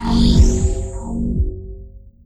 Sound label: item spawn; godot demo projects; runtime save load